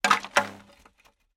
impact metal pole rusty hit loose hard surface

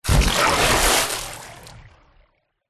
nature water splash deep designed

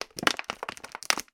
foley bottle plastic movement; sound effects one

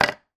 food tin soup empty down on table

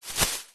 plastic bag thin empty single shake open up; foley

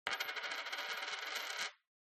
Coin Set Down Rattle On Wood; PMSFX

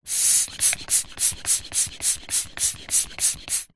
plastic hand pump pumping very fast nozzle end; zapsplat

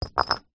foley brick down on hard ground

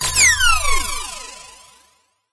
cartoon anime hit laser whine

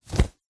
foley clothing jumper fast short movement flap; zapsplat

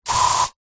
household aerosol insect repellent single burst

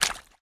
footstep water ice puddle deep; foley

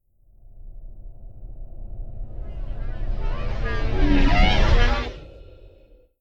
Whoosh Twisted Vocal; bottlerocket

